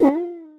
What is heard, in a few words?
EQ Perc, Percussion Mix